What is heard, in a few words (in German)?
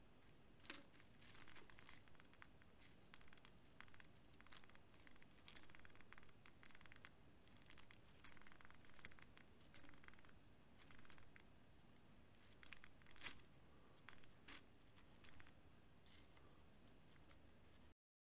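Spitzmäuse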